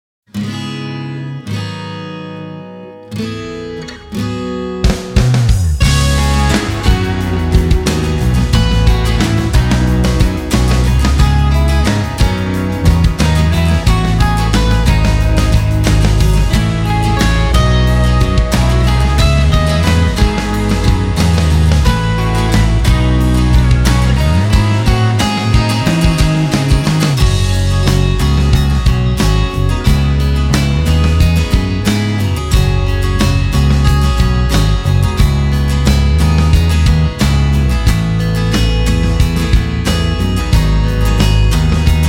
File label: Library Easy Pop Rock LIVE